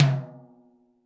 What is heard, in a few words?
percussion, Roland TD-20